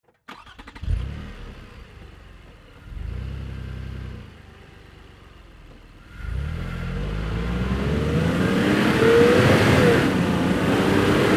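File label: Car Highlander Outboard Drive Fast Accelerates Med; 2005 Toyota Highlander V6; Decel To Med; Cars; Vehicles; Big Room Sound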